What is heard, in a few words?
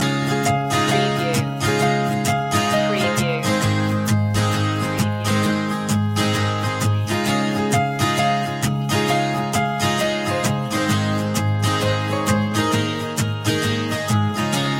happy faces loop